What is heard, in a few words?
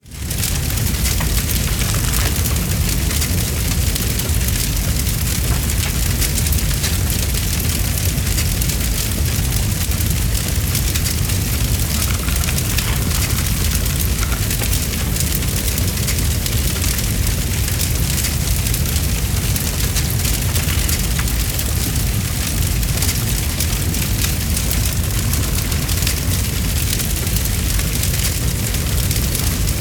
big raging fire